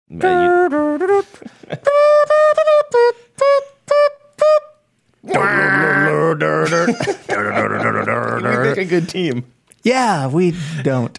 trying to sing exciting celebrate music

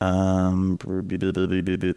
lip noise

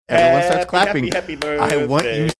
singing happy happy happy birthday clapping

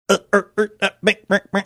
making funny horse riding sounds

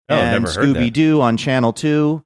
singing and scooby doo and channel 2

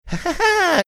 funny laugh; npc

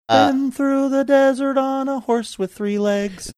singing

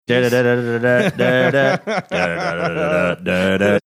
singing portion of

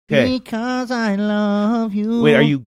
singing me cause i love you

